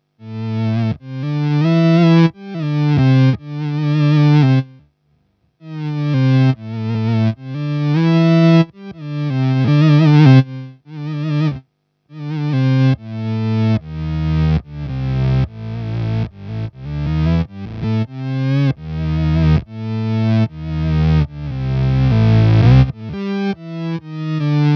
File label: hx synth